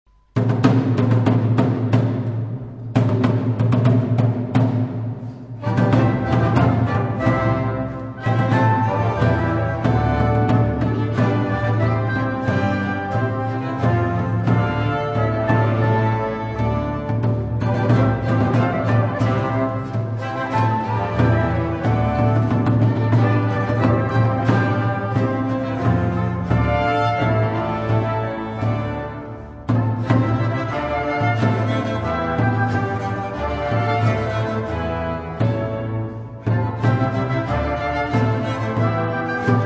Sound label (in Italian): Orologio tamburi trionfale